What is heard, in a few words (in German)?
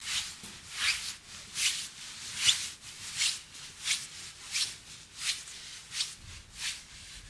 Klingelton; Skipolis